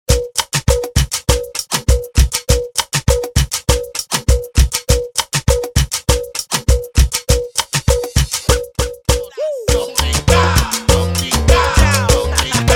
remix bpm 100; Prodeejay Remix

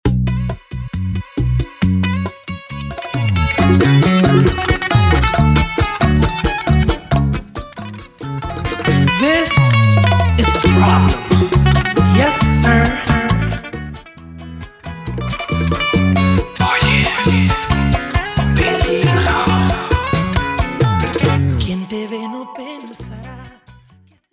Intro Outro 136BPM

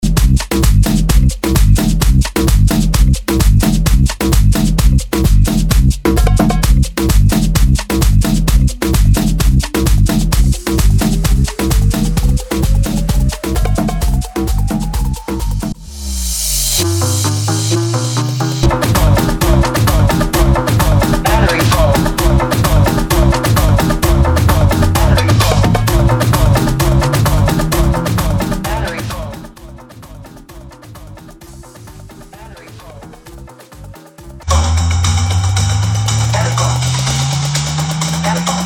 Remix 130 BPM; Bootleg Remix Edit

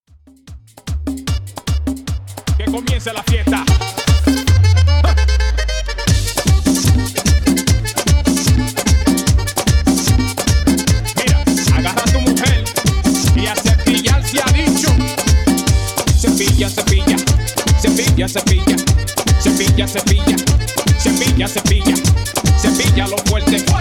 Intro Outro 150 BPM

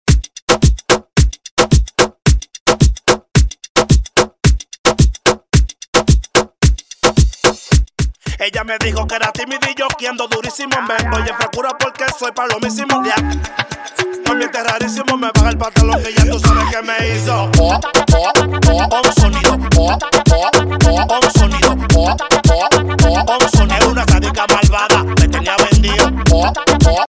remix bpm 110; Prodeejay Remix

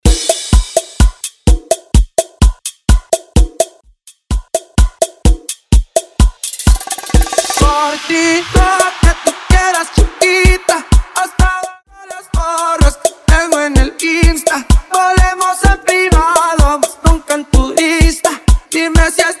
G major 127 bpm

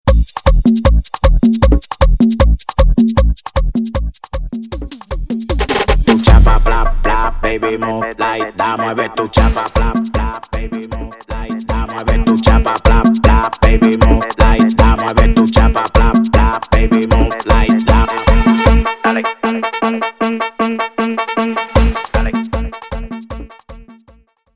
Intro Chorus 155 BPM

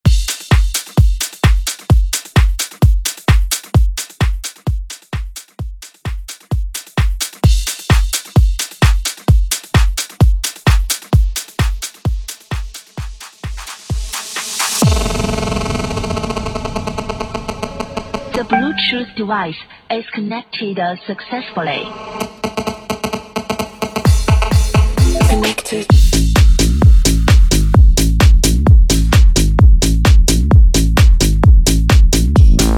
bootleg house 130bpm

